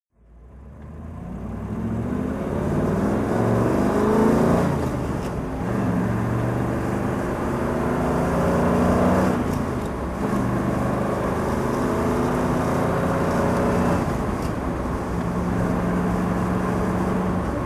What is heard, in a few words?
corvette driving